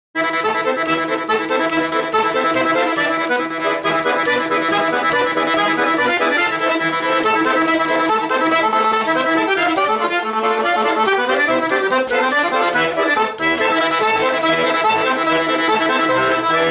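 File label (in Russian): Баритон тенор пикколка, гармоника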